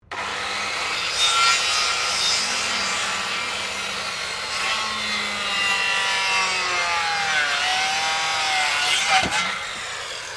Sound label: Power tool circular saw Makita cutting wood heavy load; Various power tools